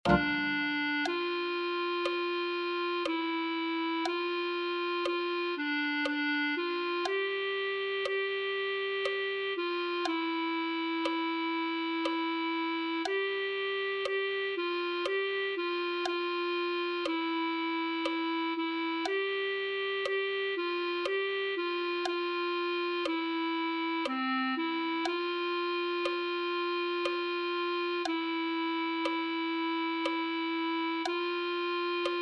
clarinet1

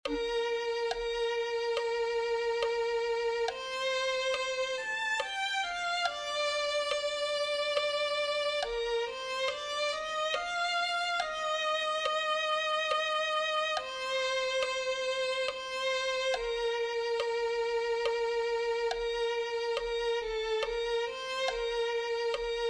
se tu nuestro dios violin

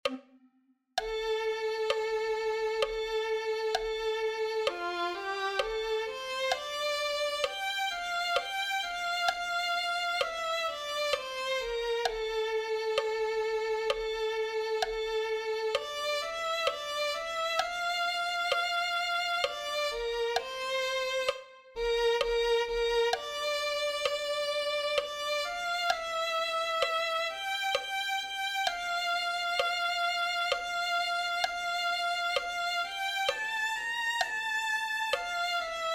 escondedero violin

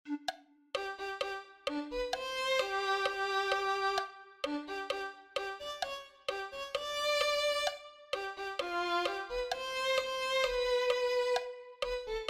se fuerte y valiente violin

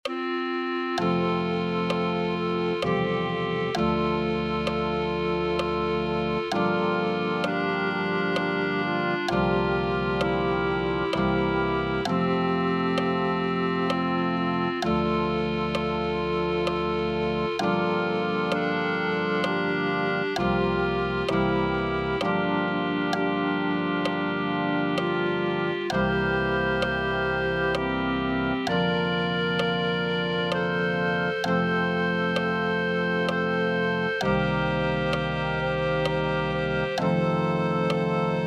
espiritu de dios clarinete

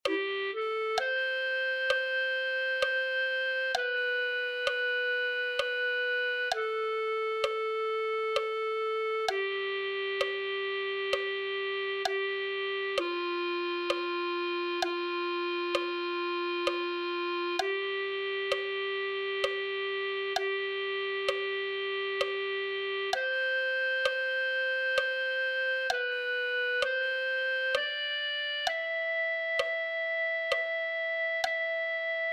oh espiritu de dios clarinete

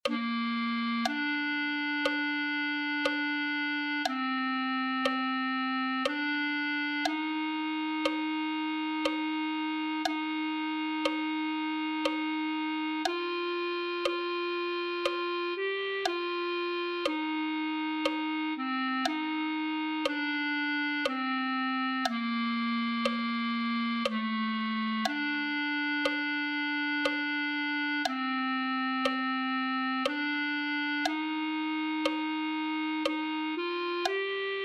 caminar señor mas cerca de ti clarinete

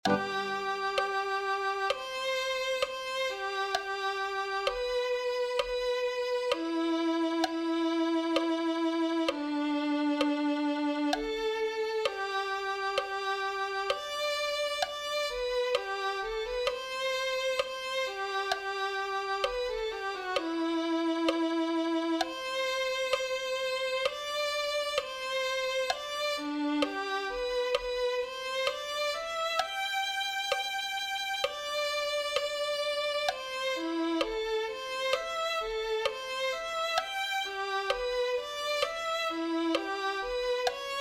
no pases de largo cristo violin

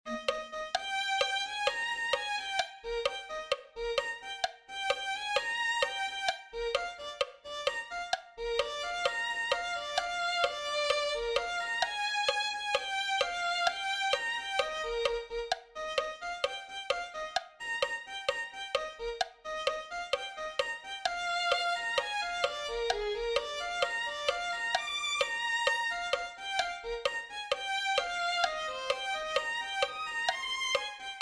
no recordeis las cosas del pasado violin

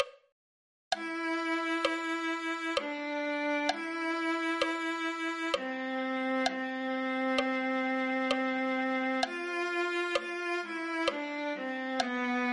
afirma nuestros pies viola

